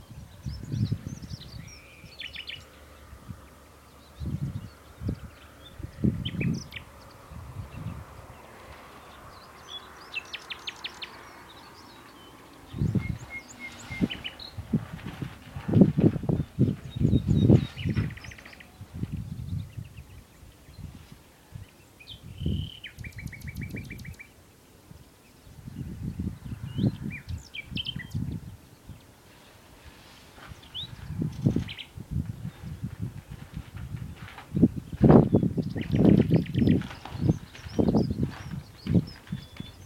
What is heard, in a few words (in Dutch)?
Nachtegaal